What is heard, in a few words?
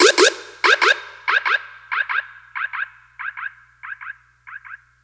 Siren Jam